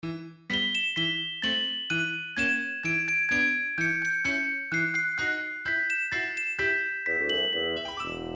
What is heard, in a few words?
CELESTA